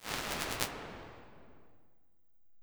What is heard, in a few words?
Warped Reverb, Impulse Responses, Medium Spaces; 2.6s; Apple; Jasmine